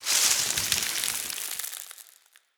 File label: confetti